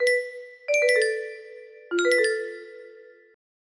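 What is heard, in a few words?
bossa nova, music box melody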